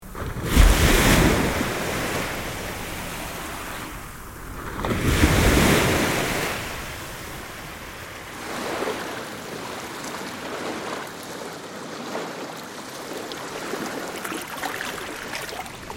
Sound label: Water Nature; Big Room Sound